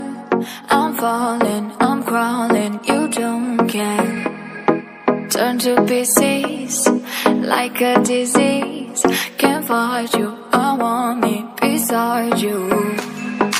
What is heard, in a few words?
beautiful english ringtone